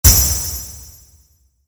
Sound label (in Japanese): ガチャ インパクト モノラル